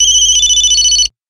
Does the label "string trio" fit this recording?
No